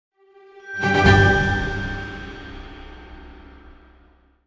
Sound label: Level Up; lego horizon adventures